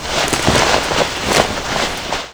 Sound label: beartrap clothing rustle